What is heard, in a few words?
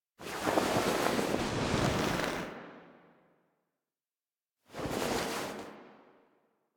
Foley Arm Down; Cinematics; Operator; Warframe; The New War; Throne Room